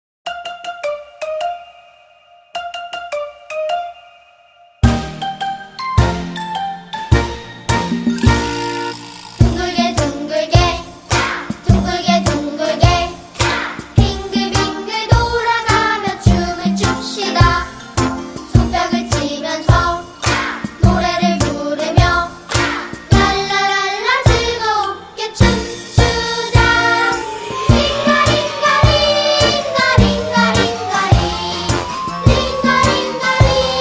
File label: Kids Melody; Squid Game2